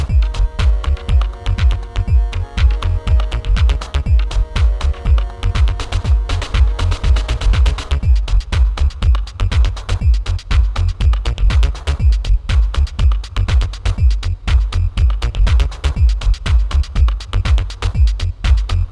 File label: 8bit desert eagle; Recordpacker